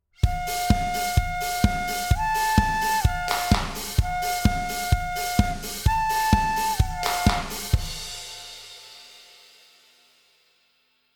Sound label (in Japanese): 和ジングル